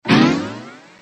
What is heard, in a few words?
Bow String